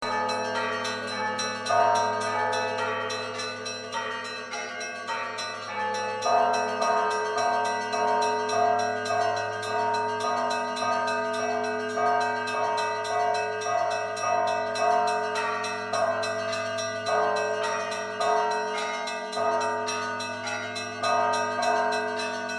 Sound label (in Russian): zvuk kolokolov